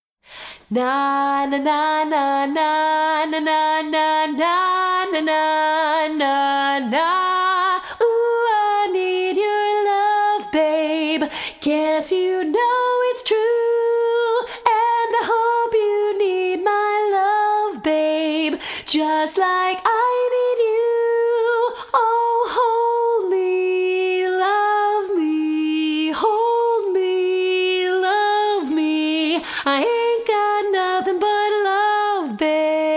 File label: Lead Alone